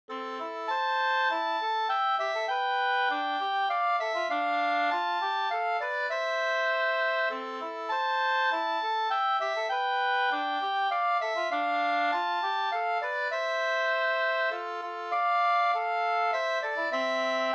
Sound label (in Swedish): folkdans; Bjorndans fr Hedemora-Sater 2st